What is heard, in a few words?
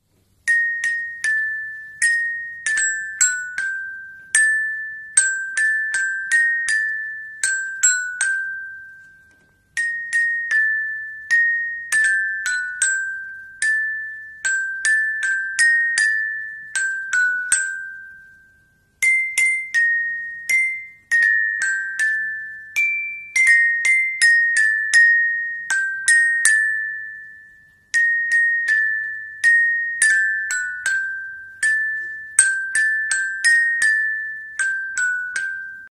Bells, bells instrument